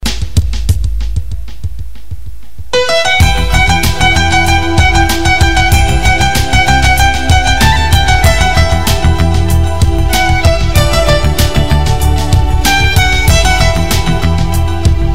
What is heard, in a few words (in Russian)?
Супер Мелодия